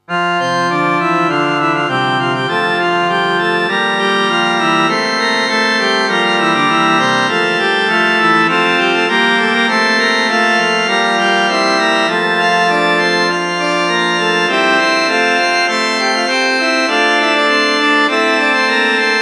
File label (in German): nun danket all und bringet ehr, orgel